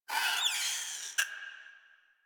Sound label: Forest Rodent Vocal Death; Characters; Warframe